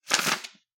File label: shuffling papers